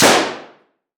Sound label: Weapon Gun Machine Gun Thompson Single Shot Interior Shooting Range; AdobeCC